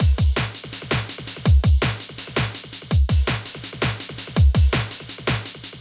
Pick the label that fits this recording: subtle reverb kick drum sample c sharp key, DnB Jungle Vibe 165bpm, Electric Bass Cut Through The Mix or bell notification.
DnB Jungle Vibe 165bpm